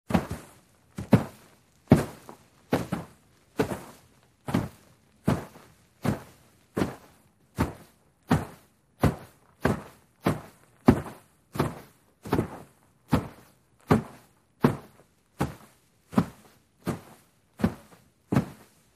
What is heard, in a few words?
Footsteps Group Sol